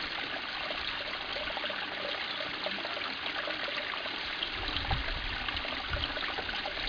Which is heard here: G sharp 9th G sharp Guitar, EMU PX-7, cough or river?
river